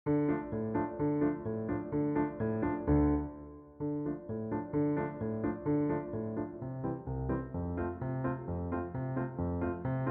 trad klezmer accompaniment accompaniment only

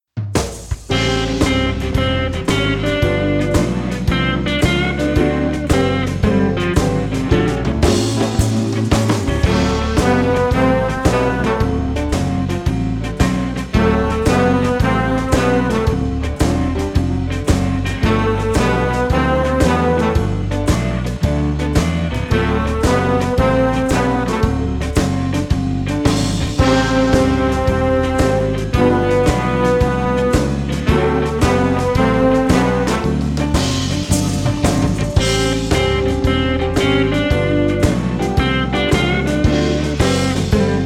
flute blues

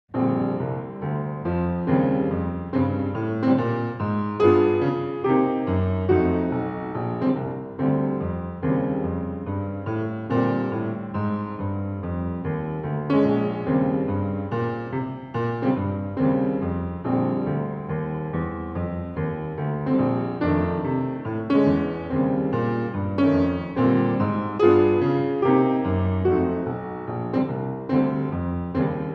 piano only

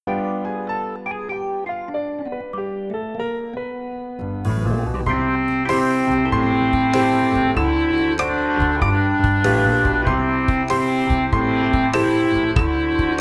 barefoot boogie, C clarinet

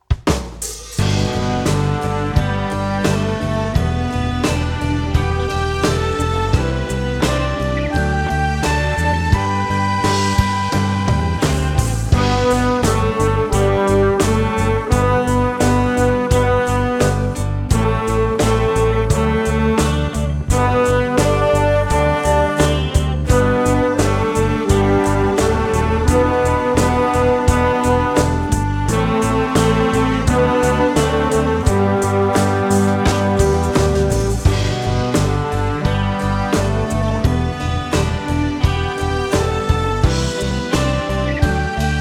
flute; Merrily We Roll Along

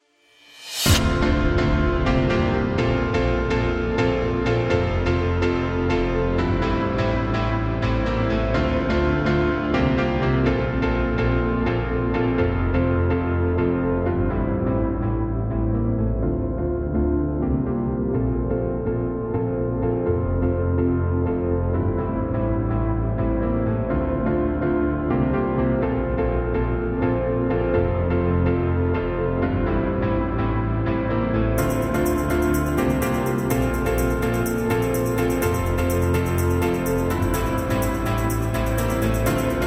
flute

